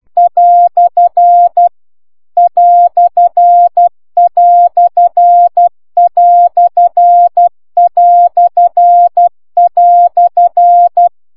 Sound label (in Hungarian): cw idezo